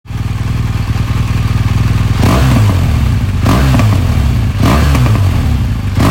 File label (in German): R1200GS mit Schubakustik; BMW